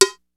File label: RY-30 PERCUS; Rubber Chicken Software Sample Bank